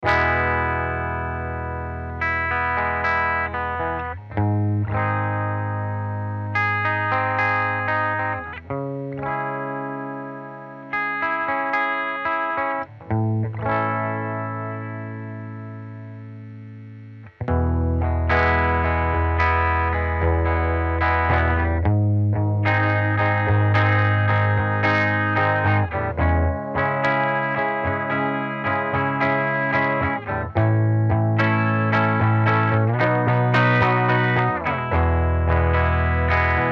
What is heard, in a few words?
Music without Drums